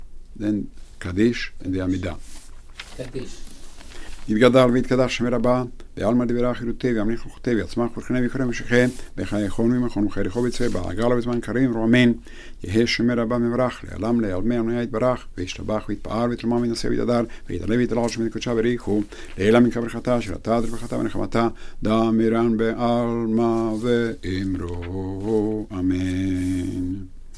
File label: Half kaddish; Weekday Arbit